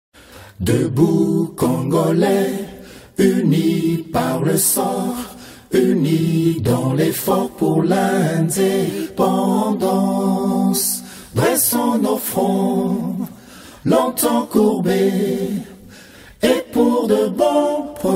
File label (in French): Hymne national RDC